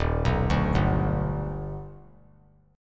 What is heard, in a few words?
D sharp Augmented Major 7th D sharp, chord; arpeggiated; JGuitar